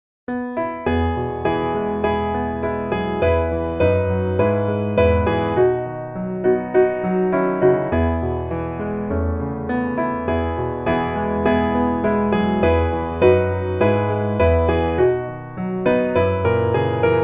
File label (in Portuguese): piano